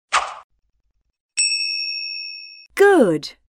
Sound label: correct shoot